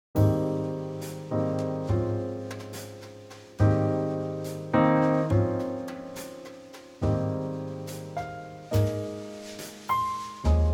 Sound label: Trio Ab